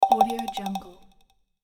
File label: incoming message